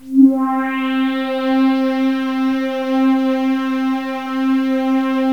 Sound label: sweep pad, synth pad; gmplay